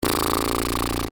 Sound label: Human Male Lips Vibrating